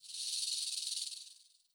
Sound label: Poison Dart Snake